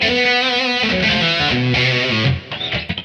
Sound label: lead 122